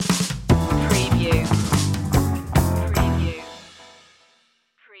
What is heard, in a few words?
cool attitude upbeat stinger